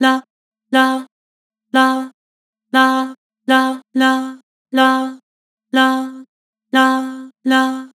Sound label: "Lah", Vocals; Harmor